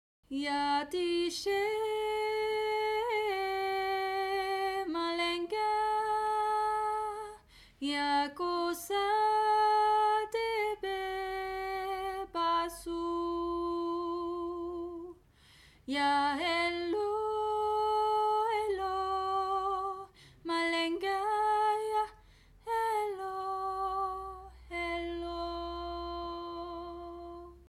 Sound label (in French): atishé haute